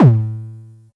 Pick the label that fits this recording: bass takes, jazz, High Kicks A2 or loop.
High Kicks A2